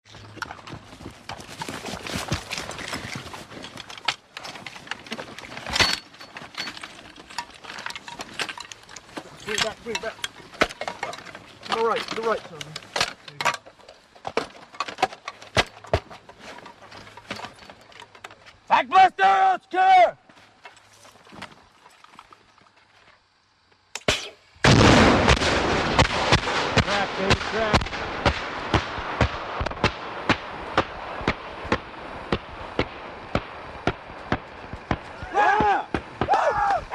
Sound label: Dragon Missile Explo, WEAPONS - VARIOUS MISSILE: EXT:; missile exterior